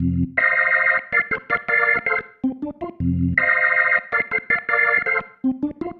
Progression, Organ, Loops; Sonic Foundry (Sony Creative Software); Joe Vitale Organ Donor; Progressions

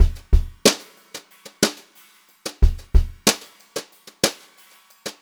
BEAT, Brushed Drum Loops

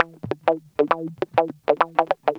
Guitar Loop; Ambient Grooves

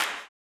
CLAP, Blues Soul; Spectrasonics Vocal Planet

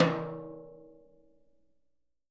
Living Drums, tom; Sampleheads; Peter Erskine Living Drums; JZ XTOM 1

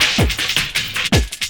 Loop, Drum & Bass, 160 BPM

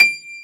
PF, GRAND PIANO; AKAI S6000 CD-ROM - Volume 1

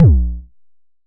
909 A#1, Kicks; Drums; MBase 11 - 909

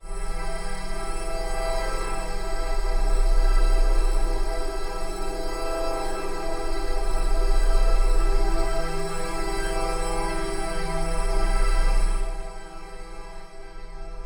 One Shot Drone E, dystopian drone; One-Shot Drones

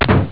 door close; ST-XX Amiga Soundtracker Sample Packs

